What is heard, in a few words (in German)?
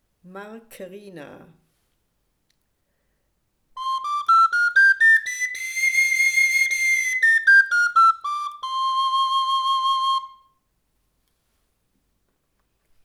Markerina Tonleiter